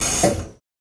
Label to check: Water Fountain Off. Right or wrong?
Right